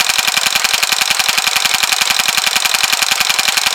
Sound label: Ocean Spinning Machine Loop Small; Ambience; Props; Warframe; Grineer Ocean